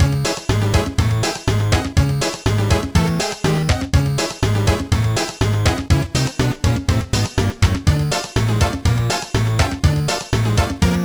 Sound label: The Search For Funk (Freeplay) [Chiptune Mix]